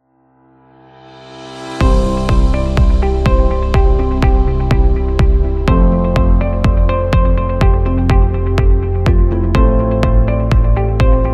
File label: Business Background; conference